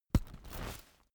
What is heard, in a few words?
umbrella telescopic close